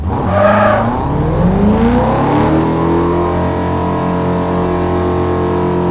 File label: rev, vehicles; TDMCarpack; TDMCars